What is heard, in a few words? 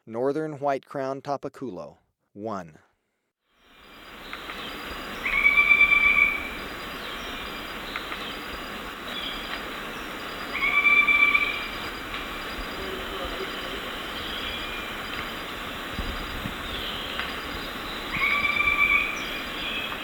Northern White-Crowned Tapaculo, Birds of Ecuador; S. America